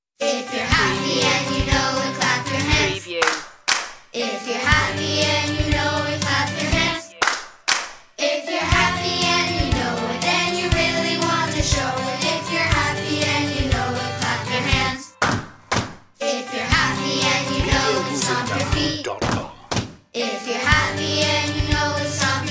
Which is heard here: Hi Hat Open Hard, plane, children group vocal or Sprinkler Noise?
children group vocal